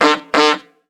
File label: Funny Auto Hooters